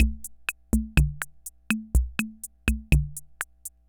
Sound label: Ace Tone Rhythm Fever Loop